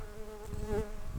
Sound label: Pascuorum workers, bumblebees